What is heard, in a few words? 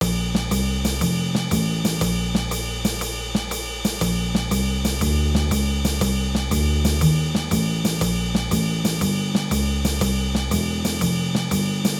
Jazz Basics